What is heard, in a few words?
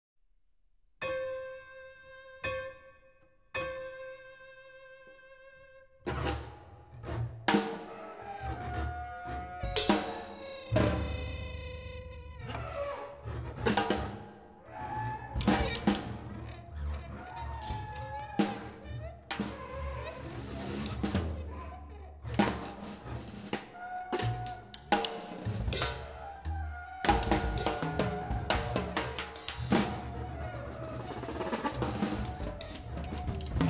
soprano Orchestra; Ucsd Orchestra; Interlude